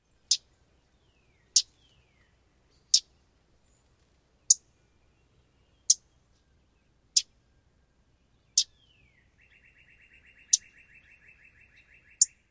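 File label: Common Yellowthroat tschat and teek calls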